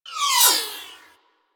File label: Swarm Cannon Projectile By; Warframe; Weapons; Corpus